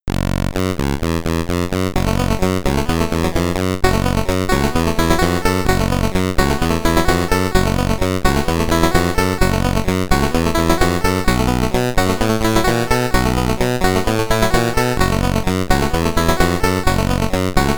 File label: SID Stereo, commodore 64; Footbag; California Games